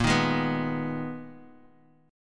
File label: Ab Minor Bb, Abm/Bb chord; Abm/Bb strummed; JGuitar